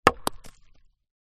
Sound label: impact wooden post stone impact hit ground